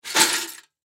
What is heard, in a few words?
Toy Drop In Can; Miscellaneous Cartoon; Blastwave; Foley